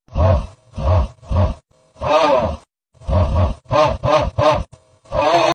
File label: uh uhhh uuuuhh